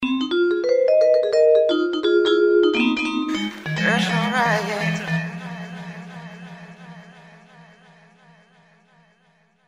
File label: Xilo Sms